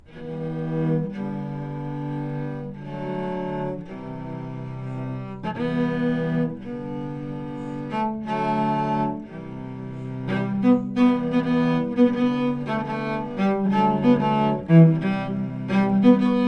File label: Cello Ensemble